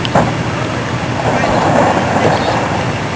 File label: ice fall, Antarctica